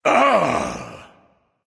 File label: "ah", discover; smite; voicepack; ullr